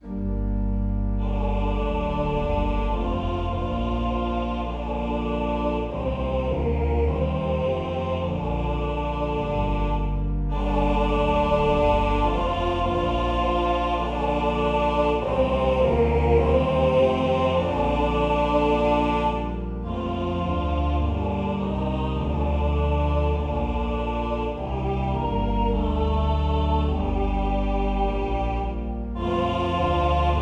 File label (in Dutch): kyrie en gloria tutti, trouwkoor; kyrie en gloria naar arbeau